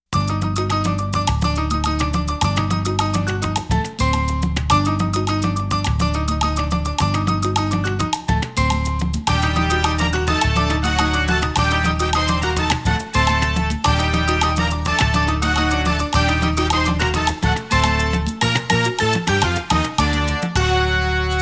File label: spanish guitar